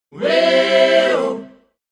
african vocals weeh oh low

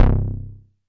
mbrute syn, Arturia MiniBrute; DRUM MACHINES

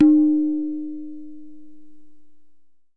tu, Tabla Kit Small; ETHNIC and WORLD PERCUSSION

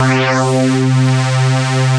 Synthesizer Middle C Notes, Lead

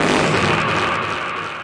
rip yer face off bass; Basses

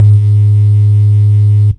synrez A Gsharp2, Hard Resonant Synth Bass

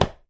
tabla

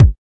kik

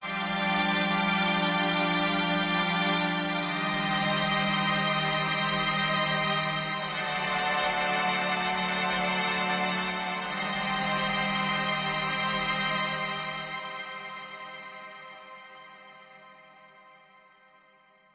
stutter string chords

